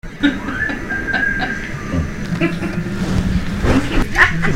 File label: laughs